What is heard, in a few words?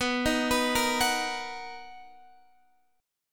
B Major 7th B down Guitar DADGAD